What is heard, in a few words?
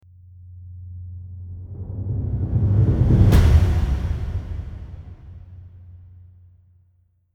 sfx riser